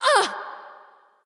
NV Ouch; smite; awilix

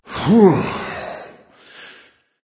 sigh; chronos

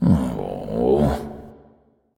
nv sigh; smite; xbalanque

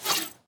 arrow flyby; smite; ahpuch skin10

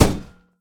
intro mace; smite; vulcan

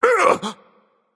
surprised, voicepack; smite; jorm

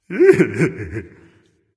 heh heh, voice; Smite; ymir skin12

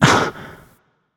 notice; smite; ahpuch

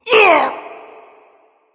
grunt hit; smite; zeus

